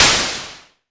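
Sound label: mbrute noise, Arturia MiniBrute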